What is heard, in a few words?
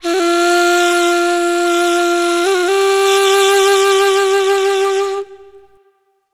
Ney, Wind Instruments